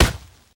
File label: fire impact; hades; smite